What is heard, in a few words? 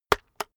keyboard external enter hit slow